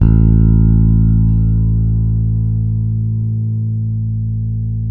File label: 6-STR FUNK, bass; Spectrasonics; Bass Legends